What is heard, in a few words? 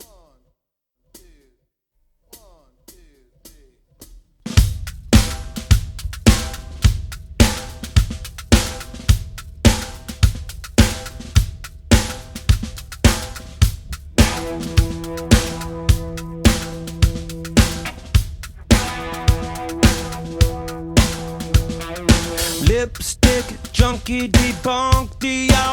bass backing track